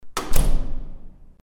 apartment door closing

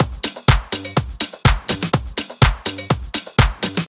good snare house drum loop; drum loops

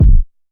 small reverb steel kick drum g sharp key

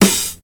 snappy snare one shot g key; snare drum samples

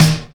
tight low end steel snare drum d sharp key

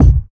low tone kickdrum d key

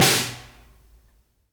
Long Tail Reverb Acoustic Snare Sound C Key

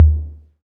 reverb tom drum d sharp key

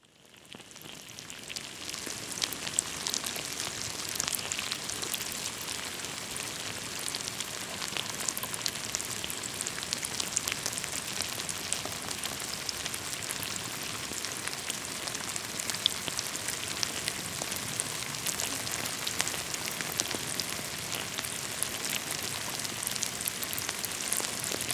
recording of 1000 maggots in sticky wet mud

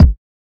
00s rich lows kick single hit d sharp; kick drum

